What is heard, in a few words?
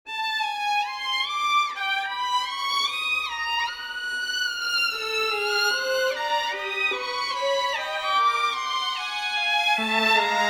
Dodecaphonic Perpetual Canon String Quartet